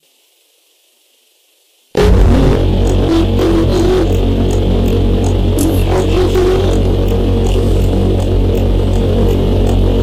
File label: wash water waves mother